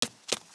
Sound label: Easter Chicken Run; Seer; GamigoNA